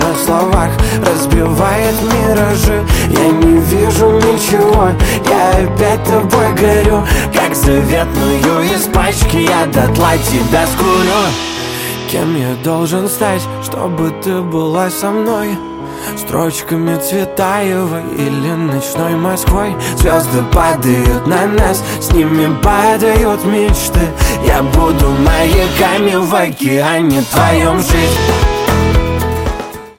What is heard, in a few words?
acoustic version